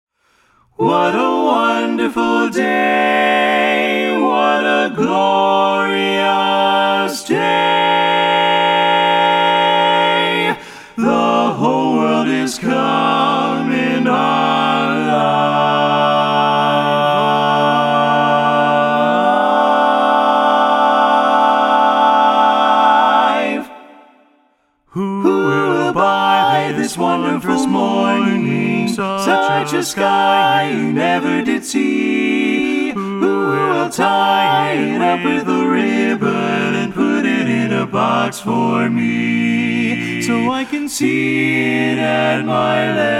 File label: - satb full mix